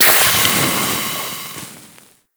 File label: Infested Pistol Gas Burst; Weapons